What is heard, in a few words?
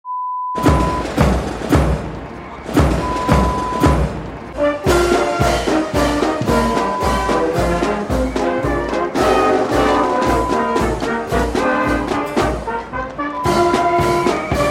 Marching Band sound